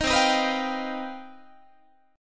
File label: C Minor Major 11th C down Guitar 4A 4E 5A 5D 5G sharp 6C sharp; CmM11 strummed; JGuitar